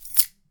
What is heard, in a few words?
Keys